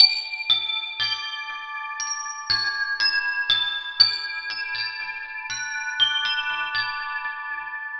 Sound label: Silver Rod Piano Loop, Ethnic Instruments; Sonic Foundry (Sony Creative Software); The Electronic Music Manuscript Collection